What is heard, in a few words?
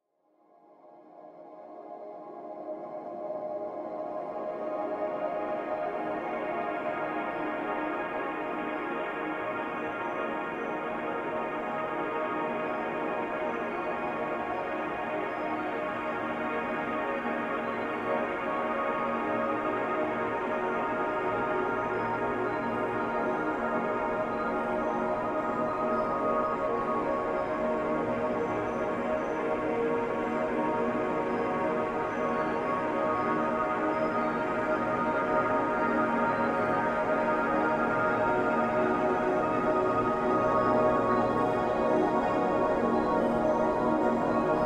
Ambient and Cinematic Soundscapes